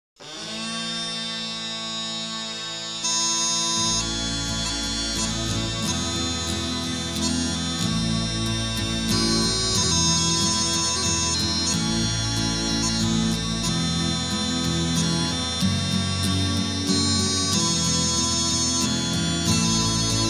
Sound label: Divine Shepherd Cowboy tune Shuttle Pipes